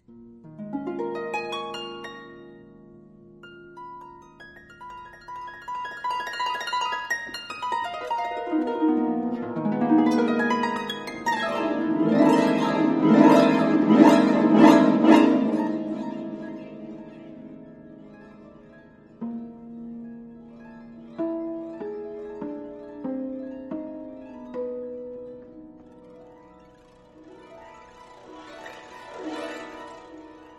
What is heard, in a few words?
Harp Concerto; Op. 25 Mvmt 3; Ginastera